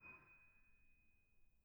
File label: harm D#7, Grand